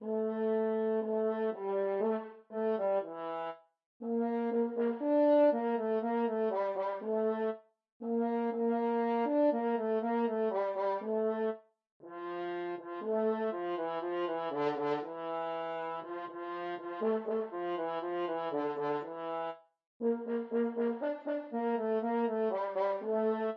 toryanse, french horn

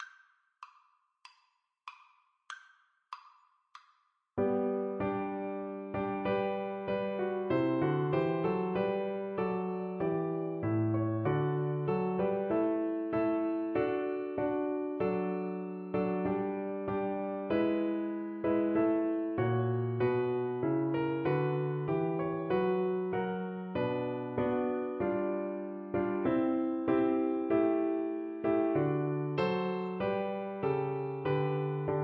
Navy Hymn, Flute

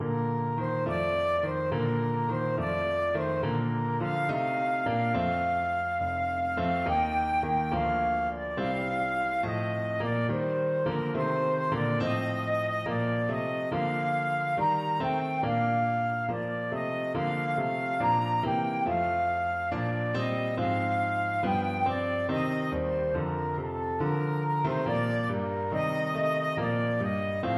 wassailing, flute

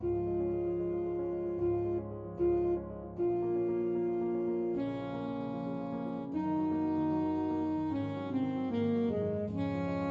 ASAX, saxophone

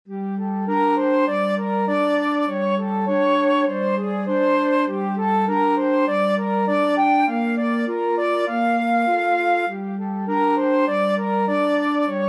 2 flutes clarinet; grieg

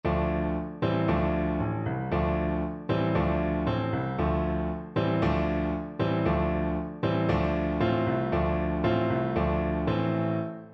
soran bushi, Trumpet

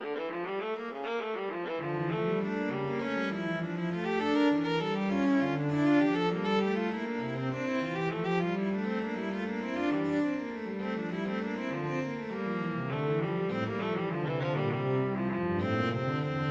bach invention, viola cello duet

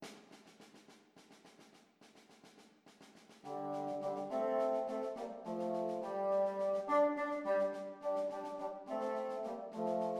bassoon quartet

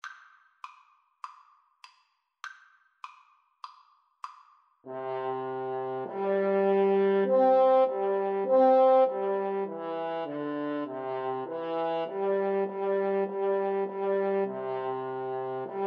trumpet voluntary, French Horn Duet

